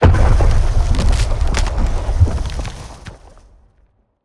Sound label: Ice Creak; Damage